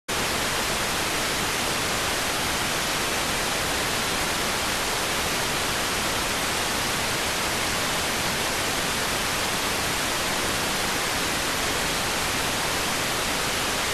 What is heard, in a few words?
rtty rx